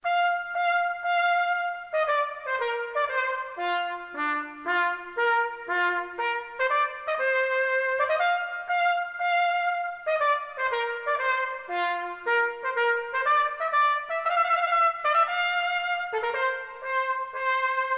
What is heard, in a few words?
Trumpet Tune Trio